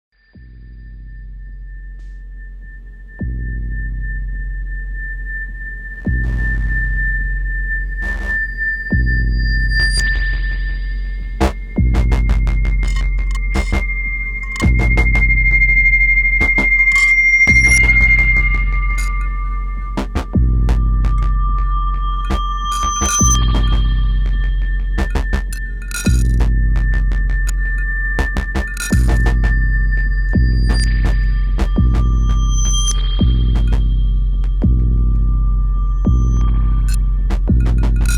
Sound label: Dub and noise